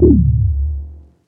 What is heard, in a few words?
Mystic Bass One Shot; Vignette Kit